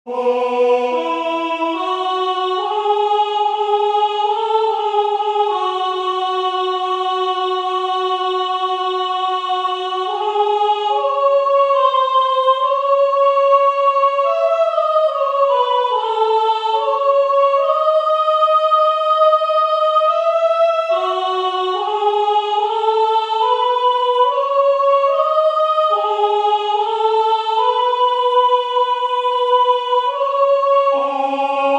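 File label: star carol soprano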